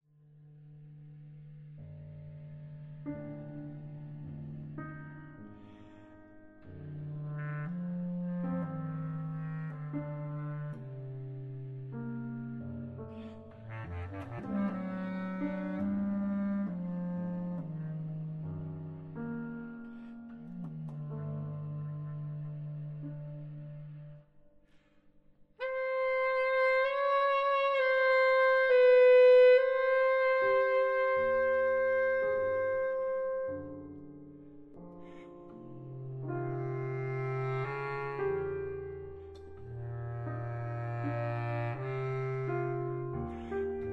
Partita No. 4 for Clarinets and Piano V. Passacaglia B flat Contrabass Clarinet